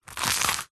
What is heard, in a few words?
human eat bite single into crispy traditional french baguette; zapsplat